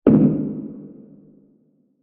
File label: Small Diffuse Wood Hit; Blasts; Impacts; Crafted Signals